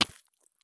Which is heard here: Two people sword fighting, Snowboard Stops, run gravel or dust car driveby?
run gravel